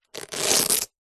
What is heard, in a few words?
rollerblade boot velcro rip; foley